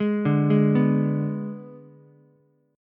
Ab Diminished Ab, Ukulele; Abdim arpeggiated; JGuitar

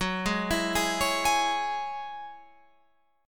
Gb 7th Suspended 2nd Gb down Guitar Open F; Gb7sus2; arpeggiated

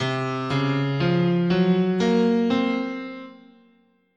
C Iwato, scale

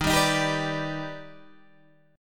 D sharp Augmented Major 7th, Guitar, Open G

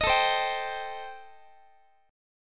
A-Minor 7th Flat 5th, Guitar, chord; Am7b5; strummed; x,x,18,19,16,18

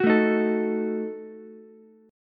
D sharp Diminished D sharp Ukulele; strummed; D#dim; JGuitar

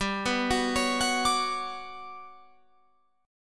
G Major Sharp 11th G down Guitar Open F; Listen to GM#11 arpeggiated; GM#11 Chord

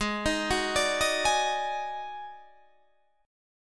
Eb Minor Major 7th Double Flat 5th

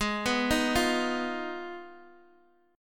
G sharp Minor 6th, Guitar Open D; x,x,6,5,6,3

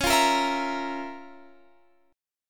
F Major 7th Suspended 4th Sharp 5th Db

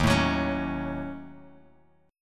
Eb Minor 7th Flat 5th, Bass Standard; strummed

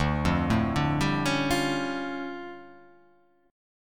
D Minor Major 11th, down; DmM11; arpeggiated

